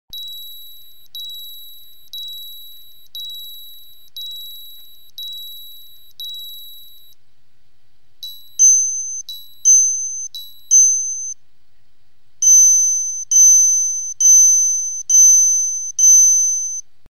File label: minute repeater; Citizen BL9009-03B